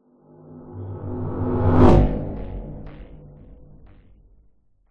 sound design whoosh hard impact heavy; zapsplat

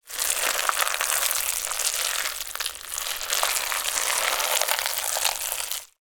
horror flesh guts movement; sound effects one

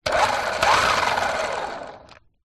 leisure car track booster motor x2 short bursts; hotwheels

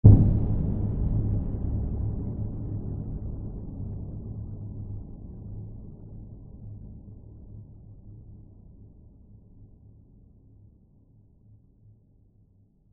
sound design cinematic hit deep low boom horror

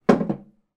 household wooden chair place on wooden table

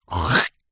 human male hack

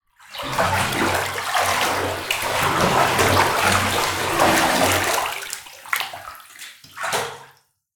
household water splash movements 2 feet deep bath

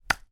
food large pepper mill catch in hand

